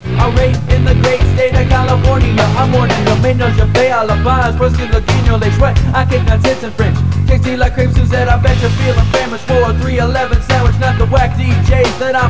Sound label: salsa